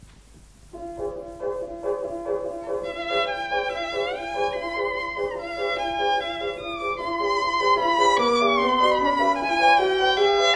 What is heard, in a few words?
Violin and Piano; Six Jewish Dances for Violin and Piano; Sher